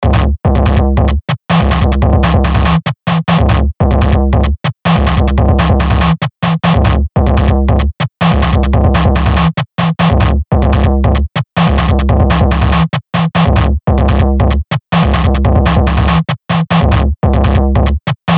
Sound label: Bed - Lo Fi (143 BPM); Best Of N2O Library; Beds